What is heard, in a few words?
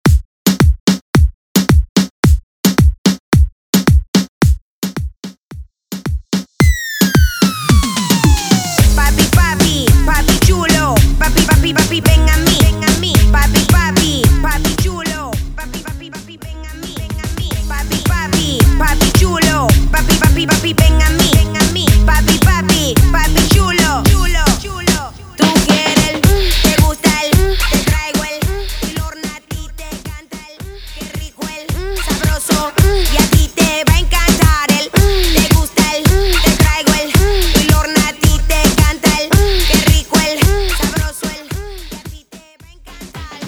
directo 110 bpm